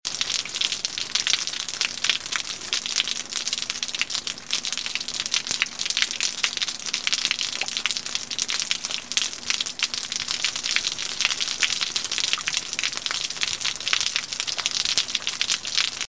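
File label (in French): gouttière; thelastnight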